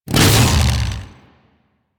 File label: Saw Melee Swing; Grn Ghoul Saw Player